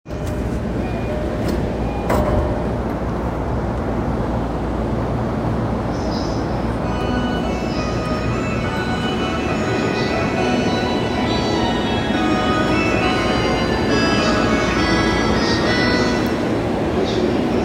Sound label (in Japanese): 中央線快速武蔵境東, train sounds